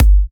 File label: G, Kicks; markus hakala